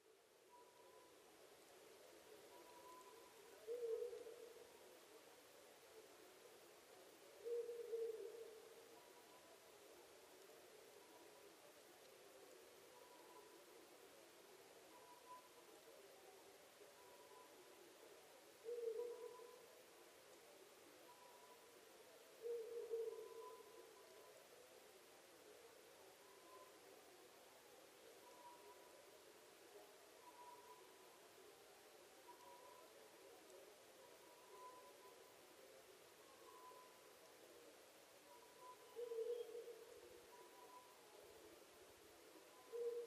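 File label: Ural owl, oriental scops owl; fukurou; konohazuku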